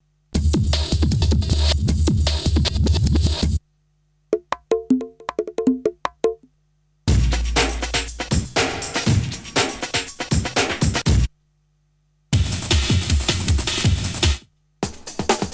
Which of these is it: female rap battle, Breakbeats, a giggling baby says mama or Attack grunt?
Breakbeats